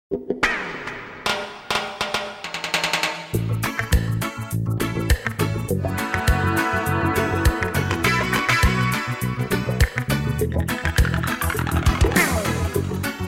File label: Ocarina (Flute Solo)